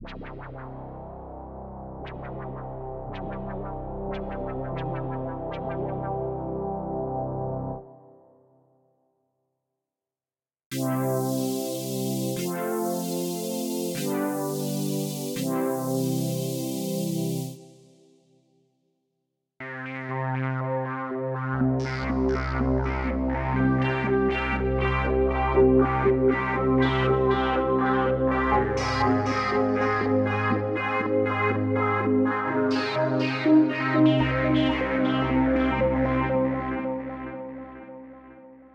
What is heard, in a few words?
synth